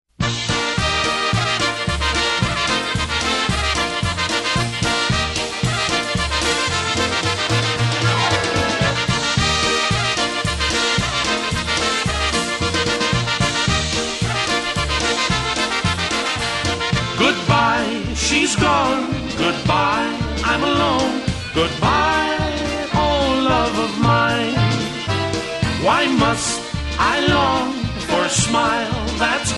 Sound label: Polka